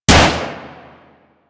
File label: Sicarus Shot 2D, weapons; DE; Warframe